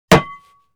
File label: Cooking pan sizzle put down on metal hob